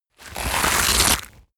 tear

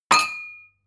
glass shelve hard

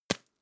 tissue tap hard

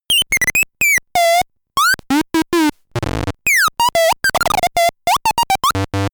talking arcade

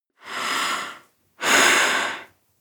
breath female breath deep

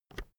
keyboard external enter push

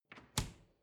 refrigerator door close short

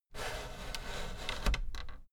fader plastic scrape double down slow

